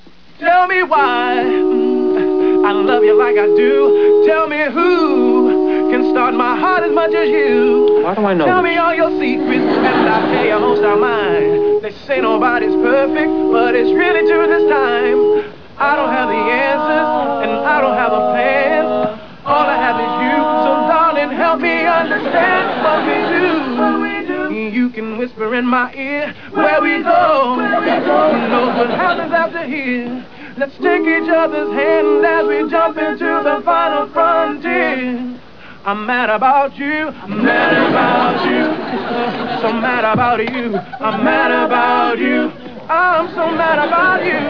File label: acapella